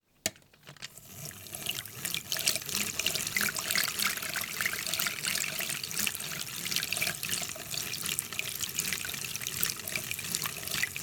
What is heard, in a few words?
Fire and food Beating Eggs